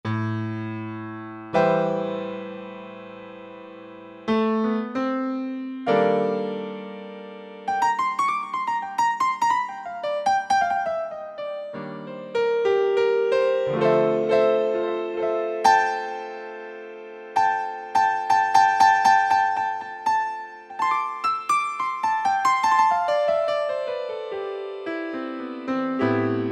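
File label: Acoustic Piano, Roland U-220; Factory Patches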